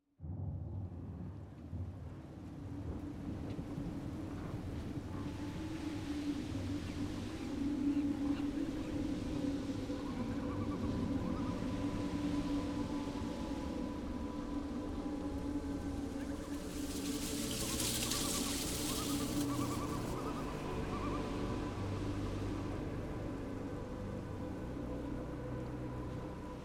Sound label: Soundscape; TAJIN